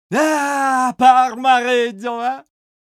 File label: ahh; parmareggio